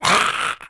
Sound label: berserk boss damaged